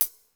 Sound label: wet hat; sr16